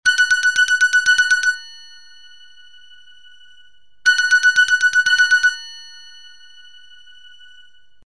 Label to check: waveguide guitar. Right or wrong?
Wrong